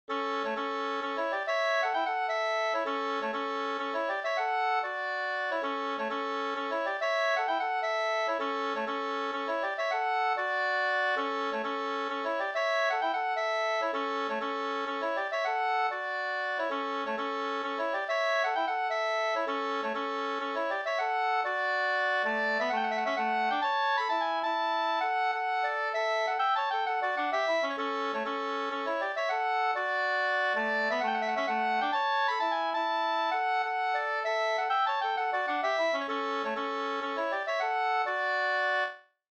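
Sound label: polska, two-part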